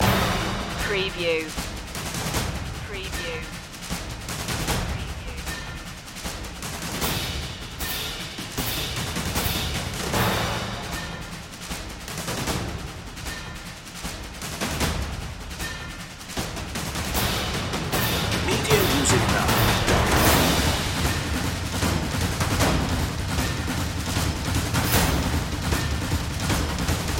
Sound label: perc